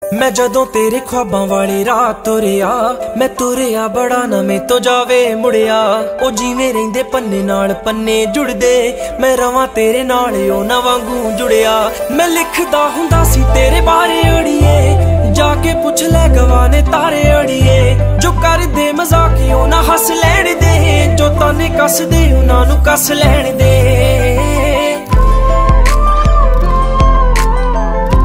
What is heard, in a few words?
Punjabi Ringtone